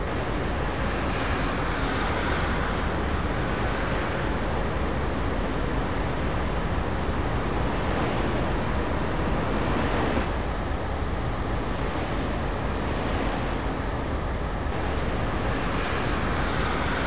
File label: ambience real city